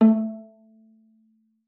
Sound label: A3, VIOLA PIZZ; Xsample VOL-5; 90 s Sample CDs